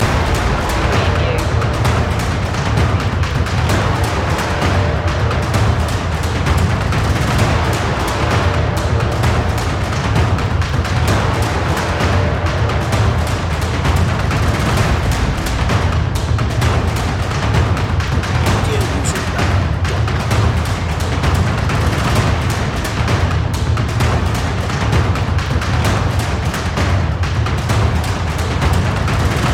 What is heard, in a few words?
industrial impact loop